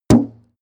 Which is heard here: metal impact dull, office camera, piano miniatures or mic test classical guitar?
metal impact dull